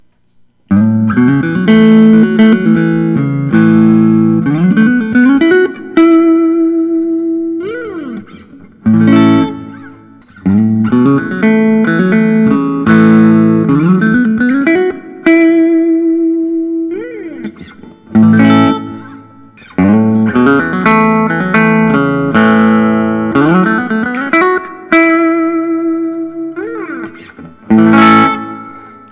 collings i 35 lc 60s neck jet black

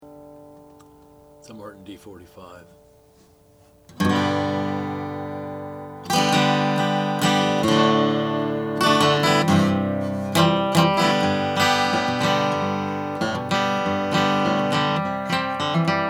martin d 45 natural mahogany

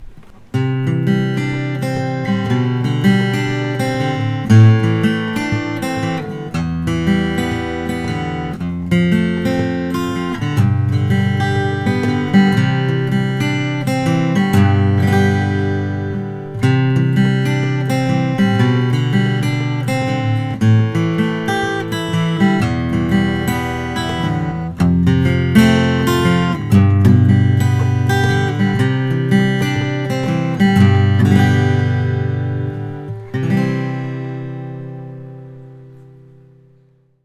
bourgeois 00 custom; natural

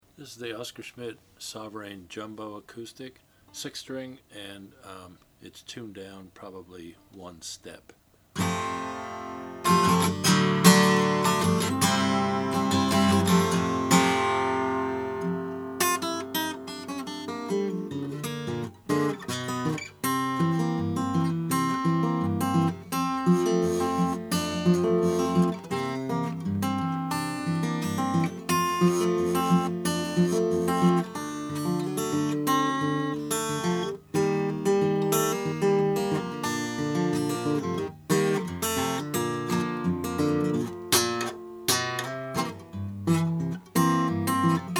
oscar schmidt sovereign jumbo natural

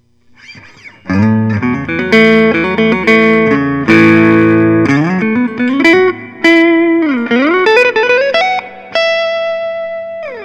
gustavsson bluesmaster custom 5 ice

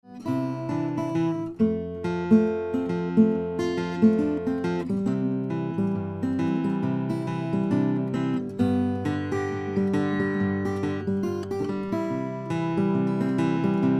s s stewart mahogany 00; 1940

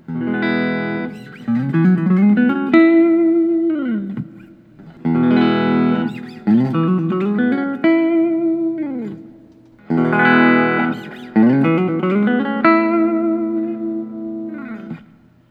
gibson memphis 59 es 335 dot reiss

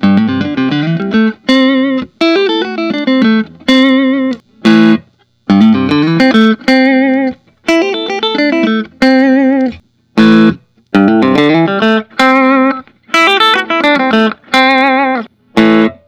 fender custom shop 50s telecaster

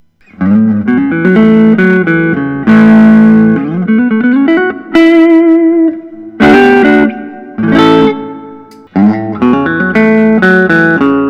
gibson 35 custom; collectors choice

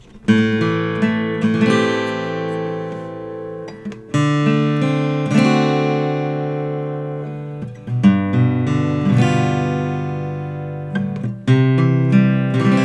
martin custom 00 45 12 fret adiron

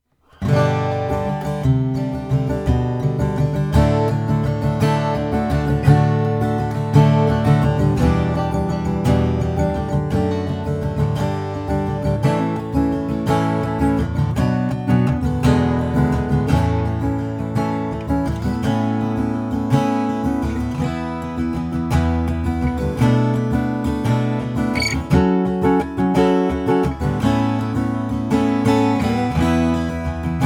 taylor 818e indian rosewood

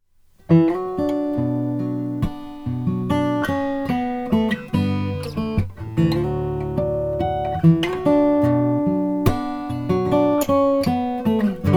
franklin om old growth brazilian

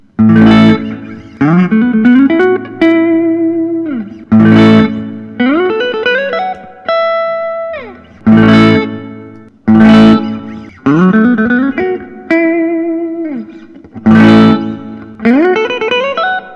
59 lp replica brazi lemonburst; gil yaron

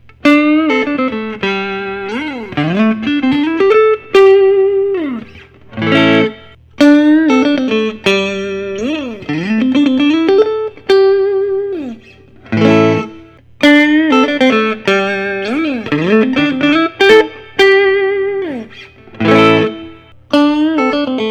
fender custom shop wildwood 10 61 stra

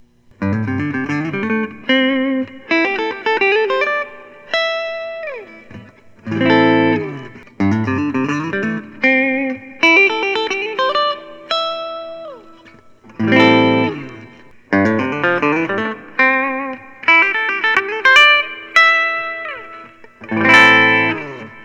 collings soco deluxe amber sunburst

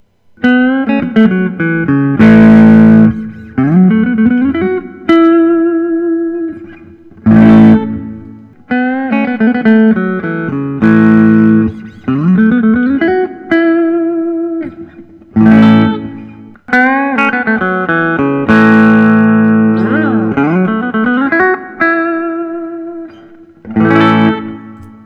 Collings City Limits Deluxe; tobacco

